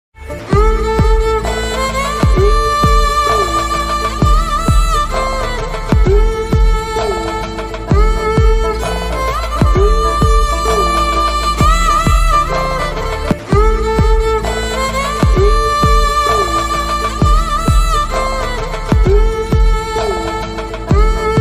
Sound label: romantic movie bgm ringtone love ringtone